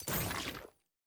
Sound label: button click; champ select; chromas